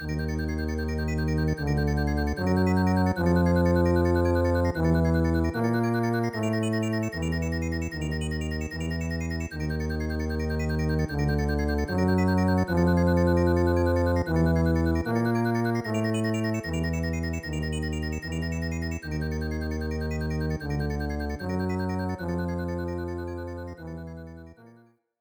Castle BGM; SNES

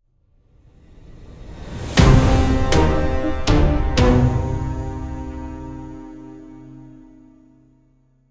Negotiation Sting Lose; Griftlands

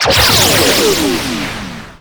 Ghost Shield; Nicktoons Nitro; arcade

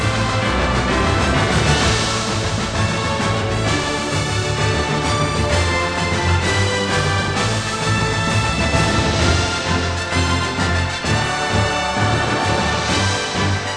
Quest Clear

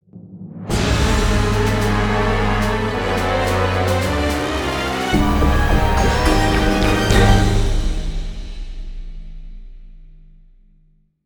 Game Defeat; macOS PS3; modern combat domination

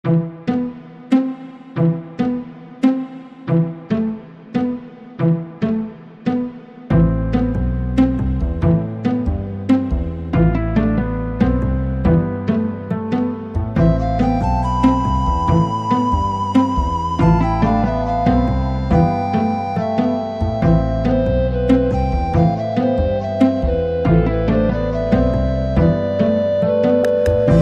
Space; Musi Space3 V1

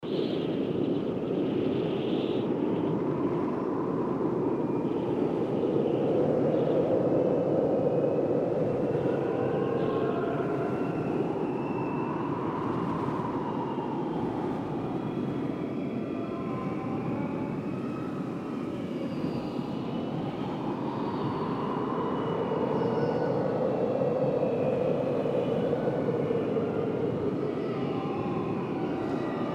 ice cave; Hoth

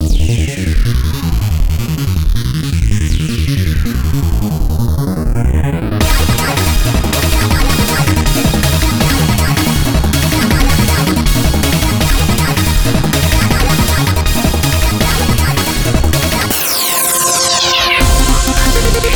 Stage; Ghost Blade; Orgasmic Stride

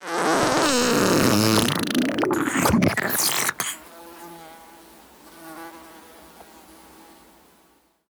fart; Animation Throwdown; cardbabies

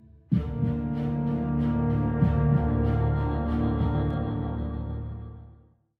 190bpm; Legends of Pegasus; windows gamerip 2012

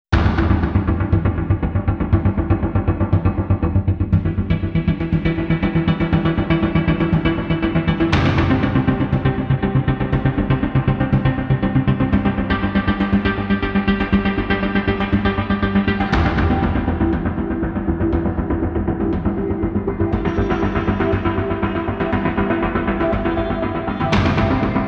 Rythm; Excubitor

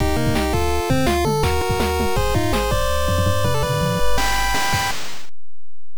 Stage Clear! (Stage Clear BGM); Blade Buster; Family Computer

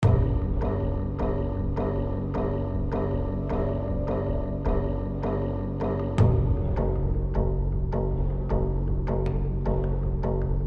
Rage Combat; Joel Rage Combat Chunk 8

